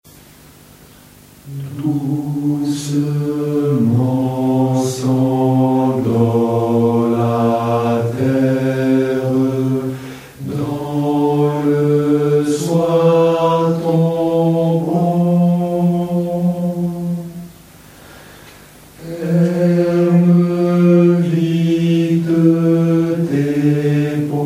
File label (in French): Berceuse cosaque Hommes